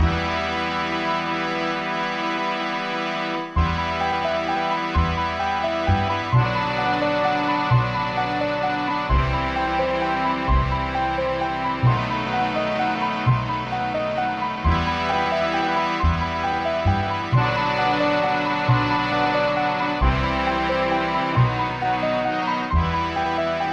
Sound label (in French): karaoké